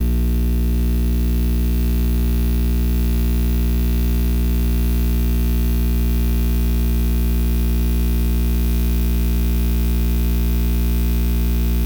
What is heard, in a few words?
Fluorescent Light In The Garage; Electronics Of My Grandma's House